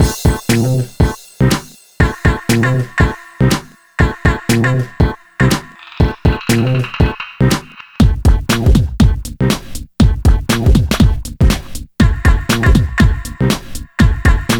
laughing funk

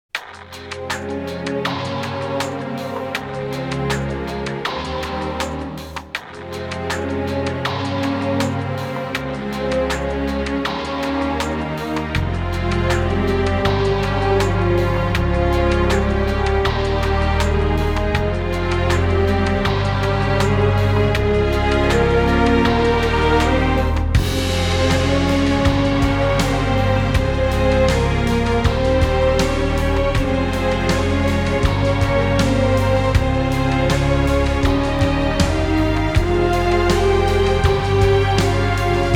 no choir

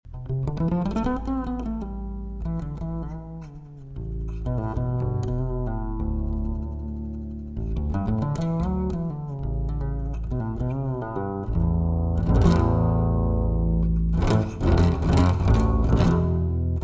bass solo

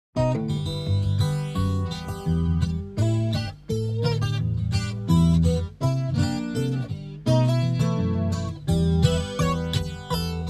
instrumental

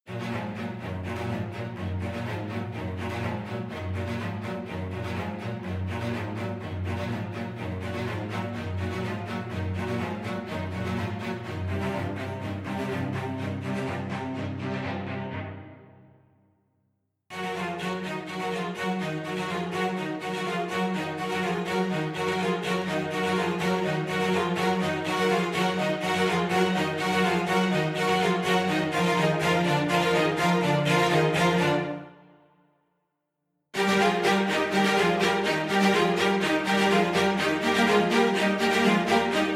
strings

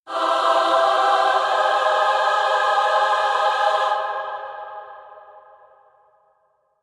C choir only

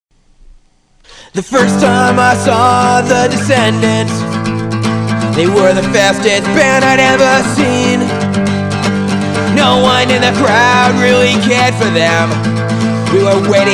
acoustic

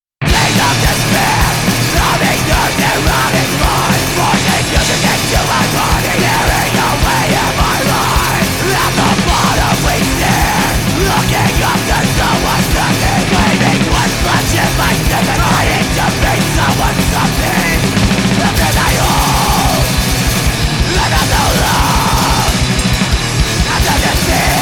choir

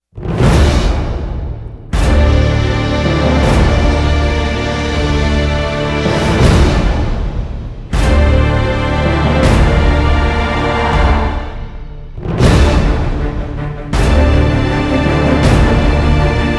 no choir

